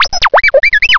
R2 WARN; XWINGTIE

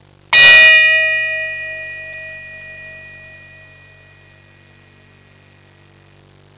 swiss bell; Sound Effects for Multimedia - Volume 1; percussion instruments

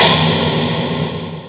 pound; Mortal Pongbat 1.4.1 68K

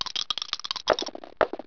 Dice; Paradise

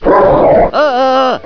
dog attack; butthead

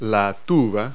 Tuba; Multi-Lingual Talking Picture Dictionary

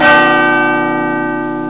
bigben

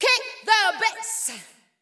VOICE GRL HOUSE 125, House Voice

